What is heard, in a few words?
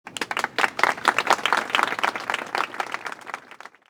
Small Applause; Levels Hub Orleans; Music Voodoo